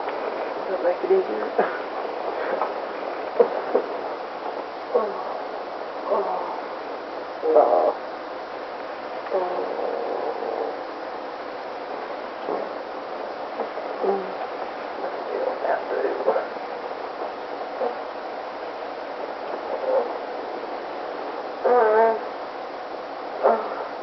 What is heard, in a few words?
quiet noisy room orgasm, Silly Lo-Fi Orgasms